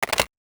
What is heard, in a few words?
Phone Put Down